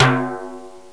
Timbale L; TG300B; Drums

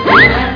vwoop

